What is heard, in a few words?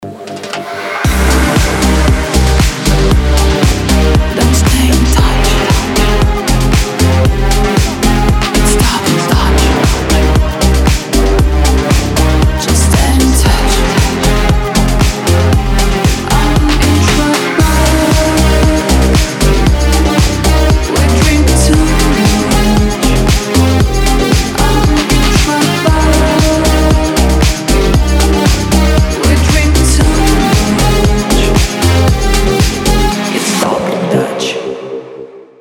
ringtone double dutch